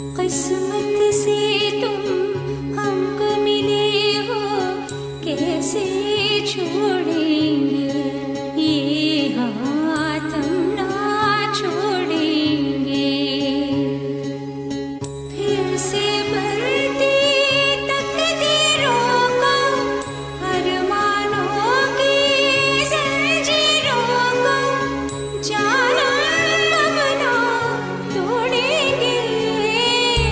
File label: Ringtone Female